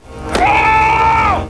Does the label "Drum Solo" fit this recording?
No